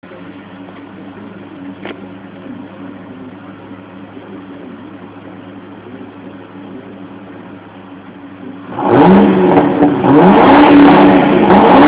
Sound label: stock, Car; Stock Exhaust